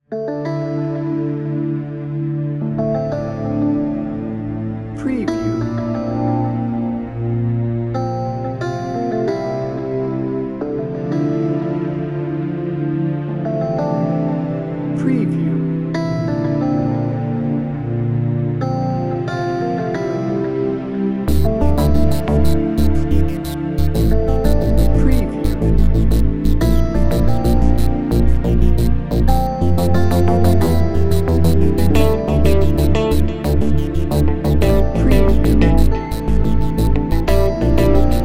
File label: somber reflections no drums